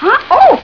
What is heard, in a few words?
monk huh ooh